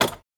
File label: phys impact metal medium rattley; Rust